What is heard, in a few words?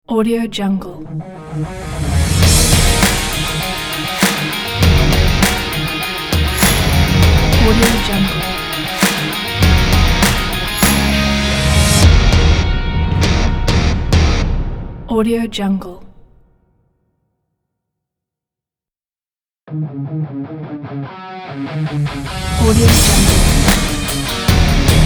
rock logo pack